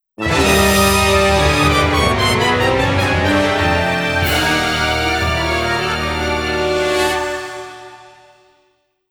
ジングル Mission failed; EDF5

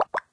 Sfx - Mushroom Gather; Farm Mania 2

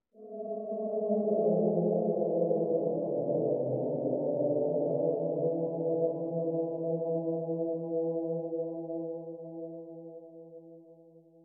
ambience chanting

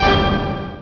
Jingle, Power Down; rayman 3 hoodlum havoc gc ps2 windows xbox gamerip 2003

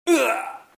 Lancer Dead

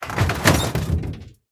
plastic break; teardown; ps5 windows xbox series xs

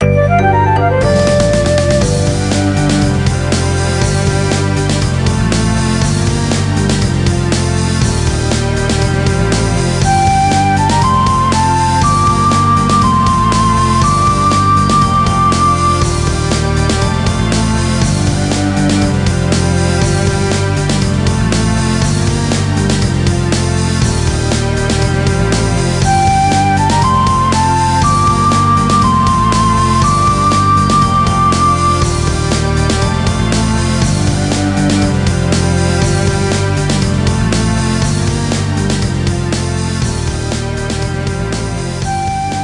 Stage Lose; Naruto Shippuden: Ultimate Ninja Heroes 3; psp

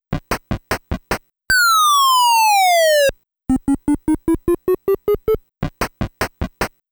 Enemy Appears; Ninja JaJaMaru Collection

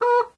Rooster Idle

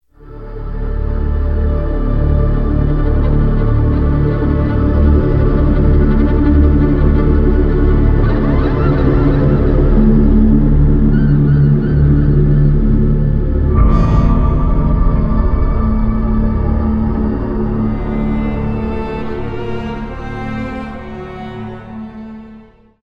suspense liftoff; Call of Duty: Modern Warfare 2; gulag

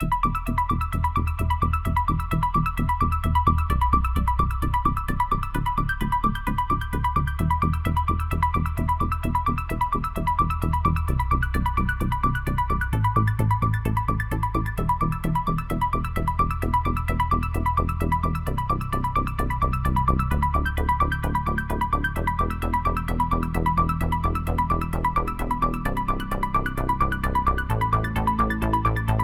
Music 130bpm; Sockventure

